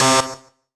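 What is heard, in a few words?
Rave Synth, Dance Mega Jungle Rave; Best Service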